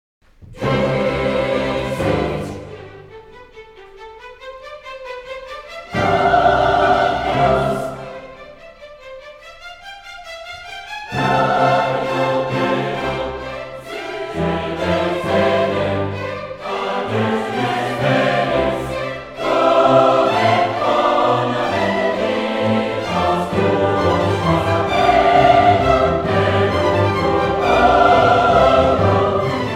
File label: Orchestra Chorus